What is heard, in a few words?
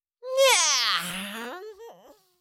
Cartoon little monster voice yawn